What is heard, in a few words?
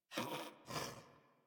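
Pet Snort; Snore; Enemies; Warframe; Catbrow; PetShip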